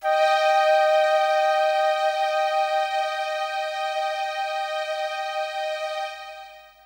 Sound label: Orches, Techno Melody; TechnoMusicMaker